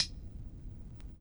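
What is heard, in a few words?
Vinyl Closed Hat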